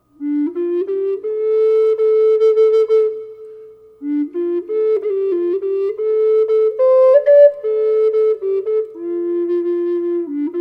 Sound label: hunter Low Dm reverb, flutes